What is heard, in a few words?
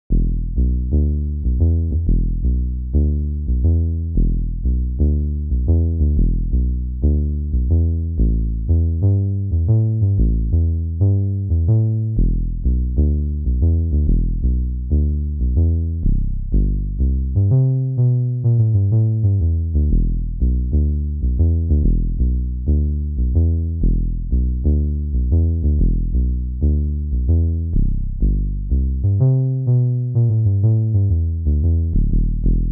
Sustain Bass; VIVO S10